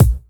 clicky bass drum single shot a sharp key, Royality free bass drum one shot